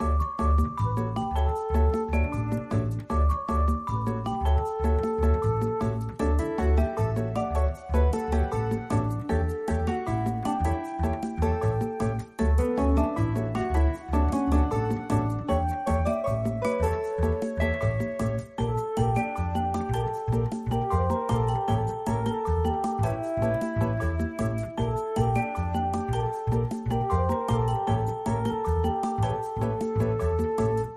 Carnaval Island Music; Dragon City

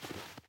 snow step; teardown; ps5 windows xbox series xs

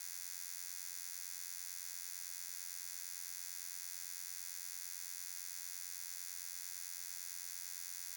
light buzz; teardown; ps5 windows xbox series xs

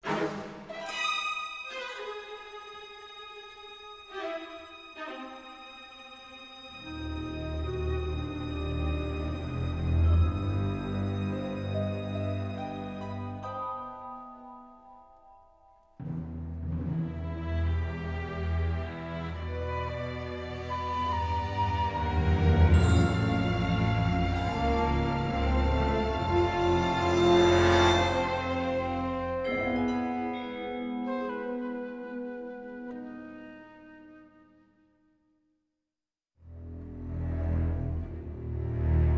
Opening Cinematic; 007 From Russia With Love

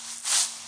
Sfx - Sickle

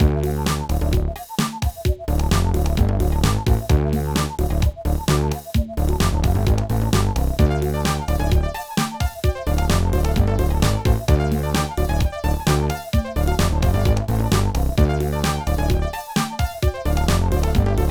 Dance Pop; Garfield Gets Real; NDS

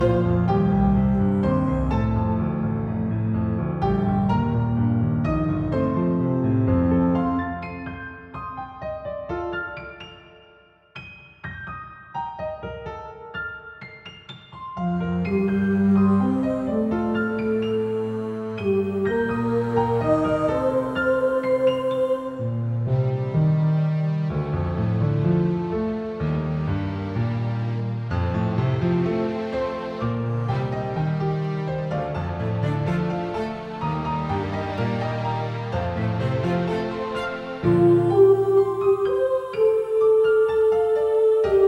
Song Loop

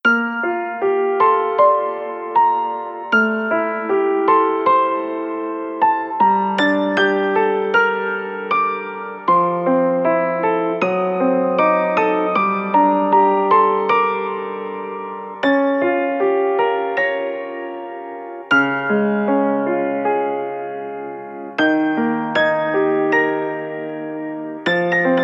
Piano; Towards Future

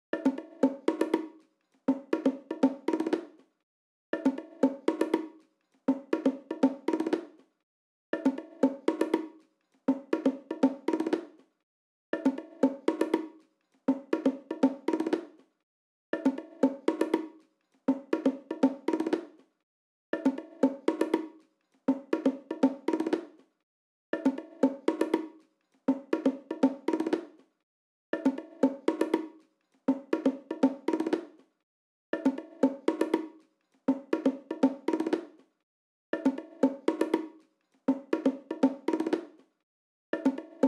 Bongos; raji an ancient epic

